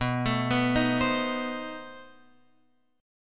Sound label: B 7th Flat 9th B down Guitar Open F; B7b9 arpeggiated; JGuitar